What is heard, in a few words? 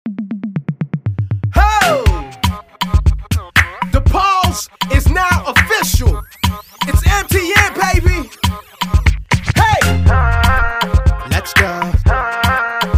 Theme Music; MTN